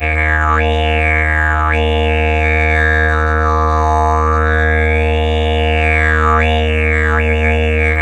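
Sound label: E flat drone simple, Didgeridoo; Big Fish Audio; Didgeridoo and Other Primitive Instruments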